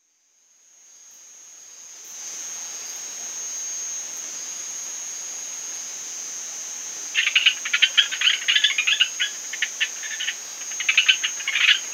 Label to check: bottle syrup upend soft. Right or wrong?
Wrong